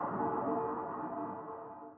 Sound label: sonar Tail Air Medium Shuttle Directional; Barotrauma; Real Sonar